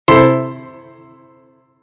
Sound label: Error